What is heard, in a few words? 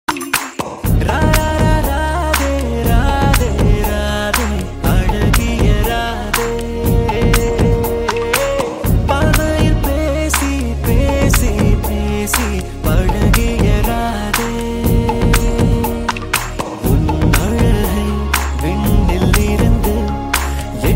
paiya love bgm ringtone